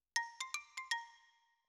Xylo Close

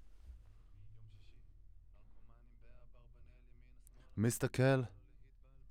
Voc OD

